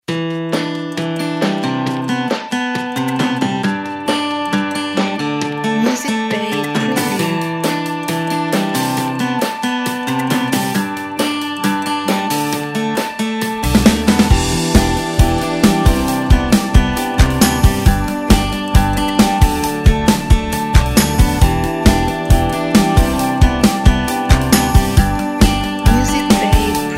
Positive Indie Rock

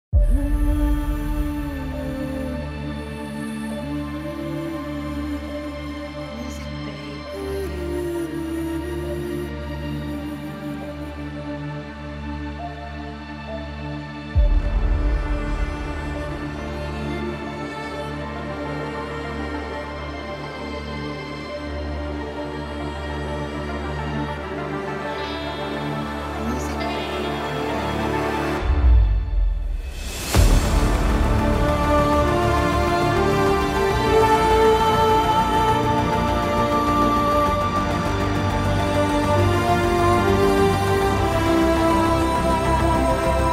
Cinematic Epic Trailer

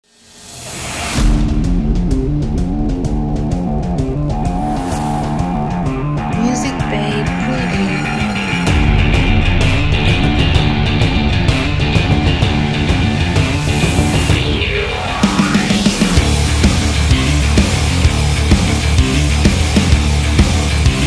Action Sport Rock Trailer

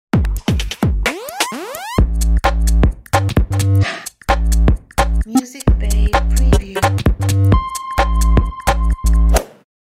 Glitchy Futuristic Ident

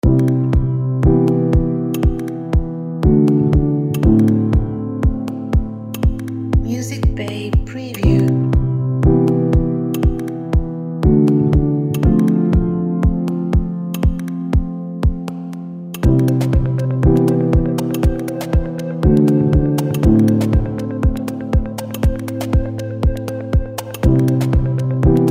Ambiance of Journey

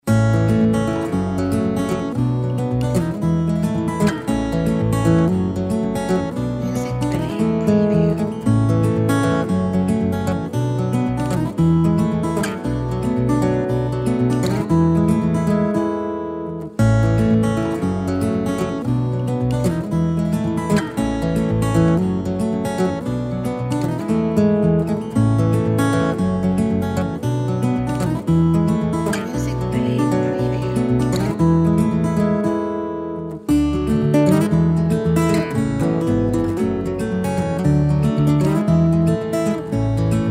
Acoustic Guitar Folk

